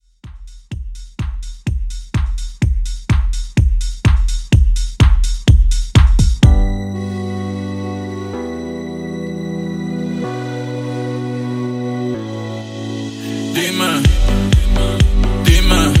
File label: House Mix; Remixfreakz